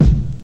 solid bass drum one shot B key

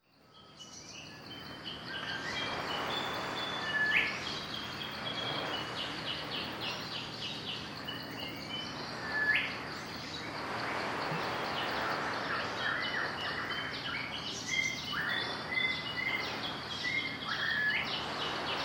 tropical birdsongs; soundscapes

